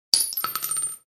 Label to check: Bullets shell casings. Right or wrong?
Right